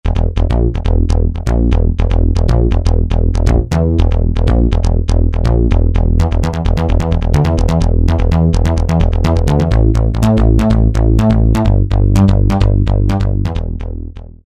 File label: Bassic House, Roland SR-JV80-01 Pop